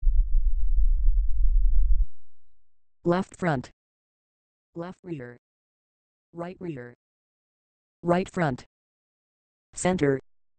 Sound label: 6 channel test